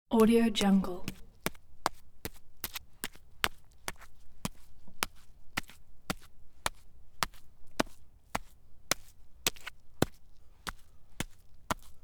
digging muddy garden

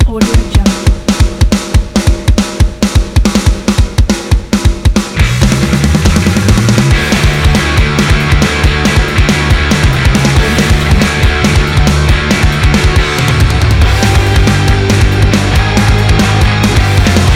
heavy metal

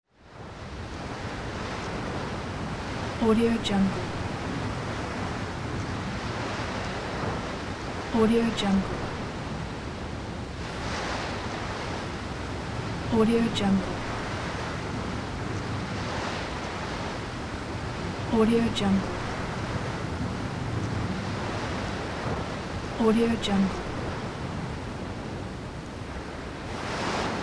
wind

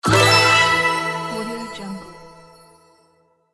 celestial win

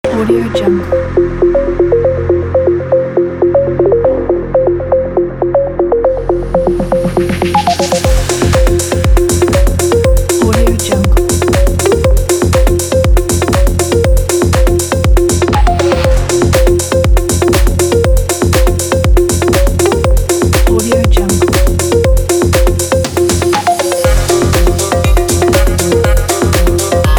techno pulse kit

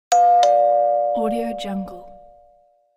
doorbell

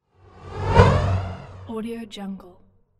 space whoosh

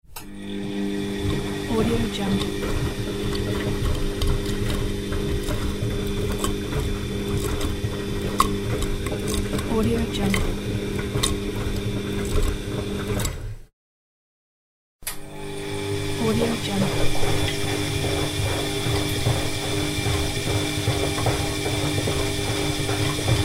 washing machine

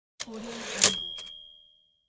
typewriter antique end of line

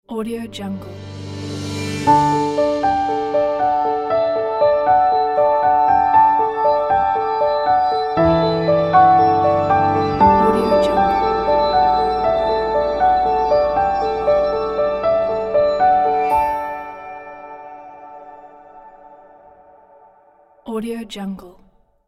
magic piano ident logo

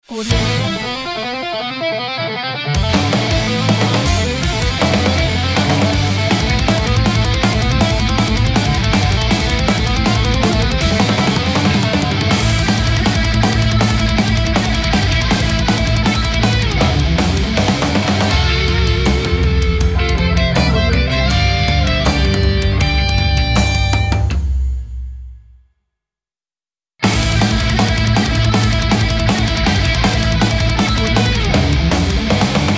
heavy metal title screen ident

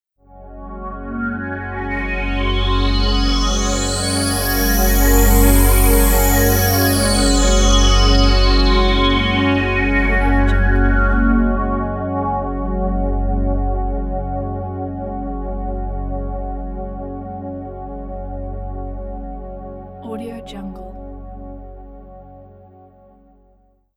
jd800 sweep ident

